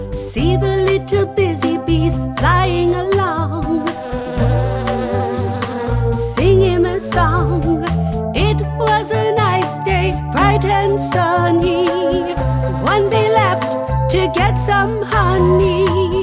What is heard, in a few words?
Bee Bossa Nova